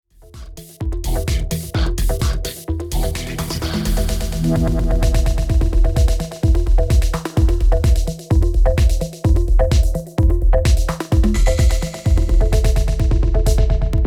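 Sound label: Denon DJ X1850 Echo Frequenz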